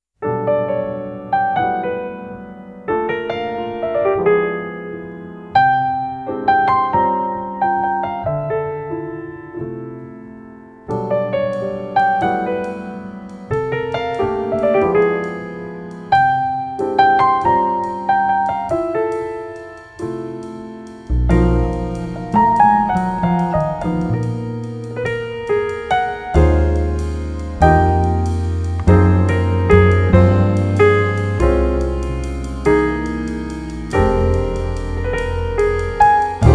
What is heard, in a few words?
Jazz Trio